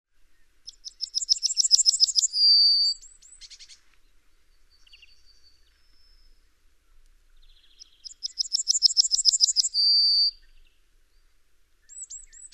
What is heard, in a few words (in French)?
bruant jaune chant